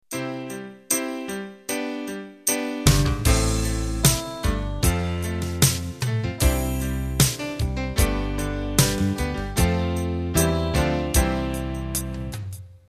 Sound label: Cavern Pop Intro